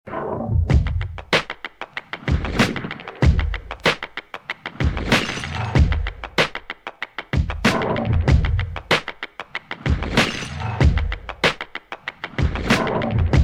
drum inst

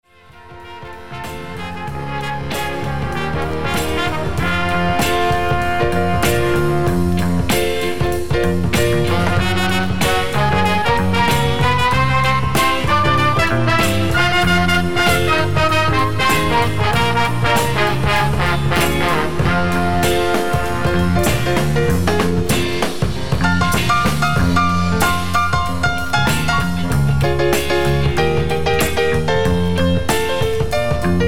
unusual sounds funky fanfare

